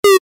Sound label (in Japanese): ピウ レトロ